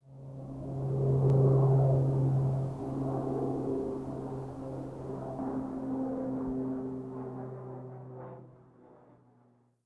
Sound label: airplane; dod